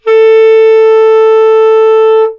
clarinet

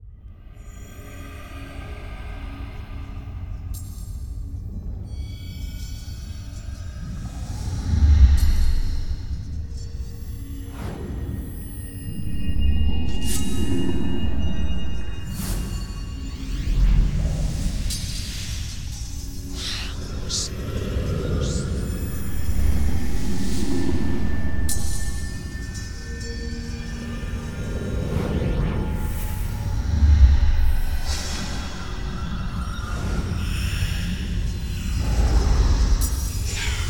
Ambience All

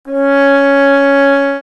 Sordine cs4, horn; dsp2008 sound library

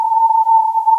bwoop

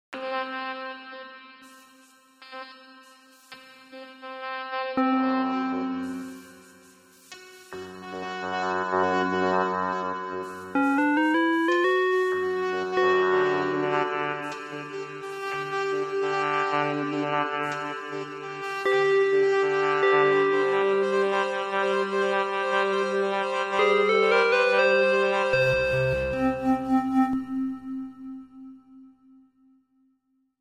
Tone Maze

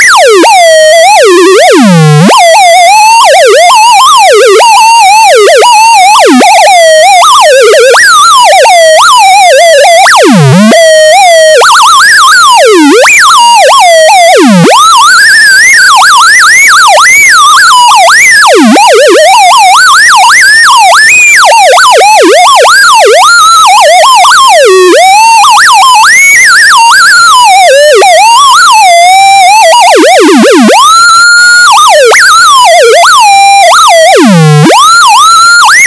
rhythm

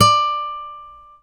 Guitar D6; dsp2008 sound library

